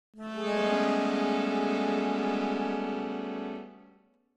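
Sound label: Accordion Keys Tension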